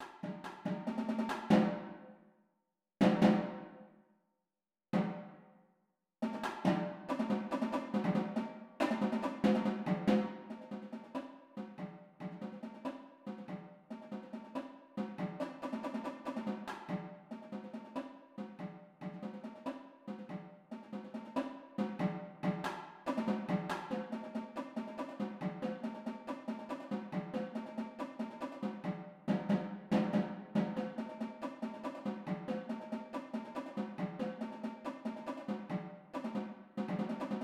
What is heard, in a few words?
tenors